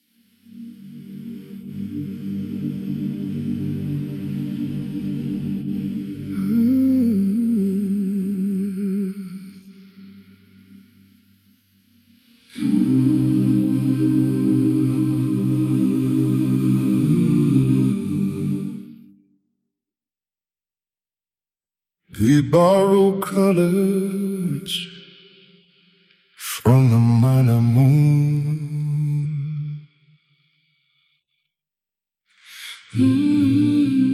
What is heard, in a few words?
stem vocals